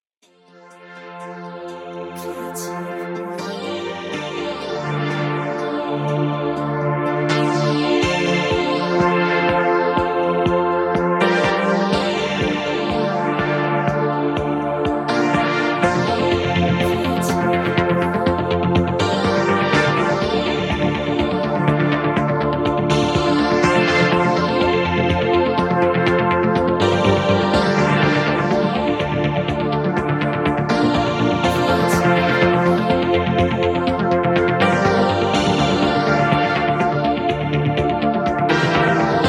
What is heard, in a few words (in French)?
VHSong, musique dansant rythme annees 80